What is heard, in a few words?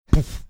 making flashbulb going off sound